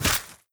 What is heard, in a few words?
step light, footsteps, player, mud